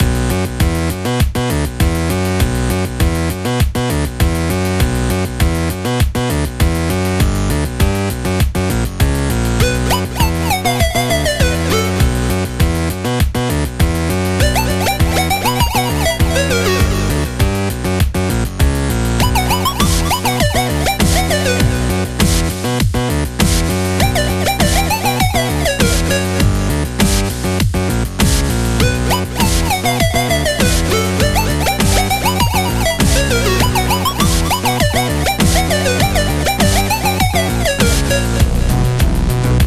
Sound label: Level Ice